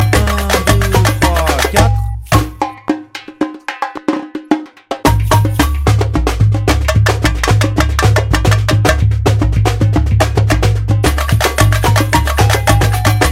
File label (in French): relance répinique